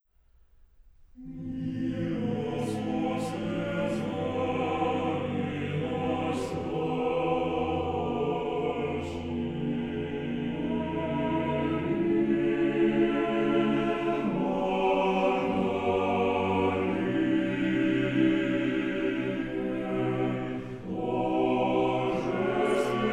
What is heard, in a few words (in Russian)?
Хор Валаамского монастыря